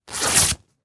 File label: Cotton Rip; Sounds Visual; 50 Flat Design Sound Effects